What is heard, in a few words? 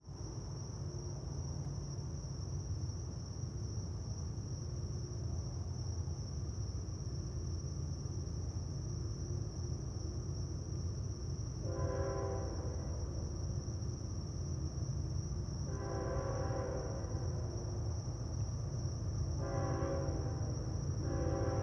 TRAIN